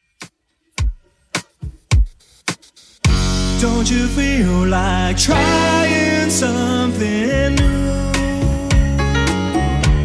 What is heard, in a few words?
80s Redrum 106bpm CLEAN